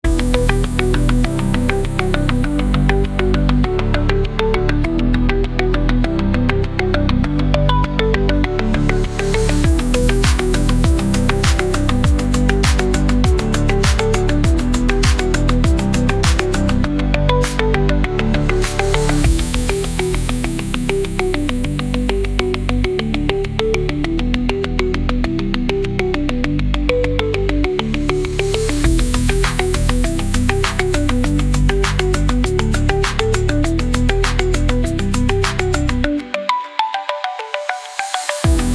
100 bpm Picking Guitar Synth Pop Rhythm, instrumental; ai social media music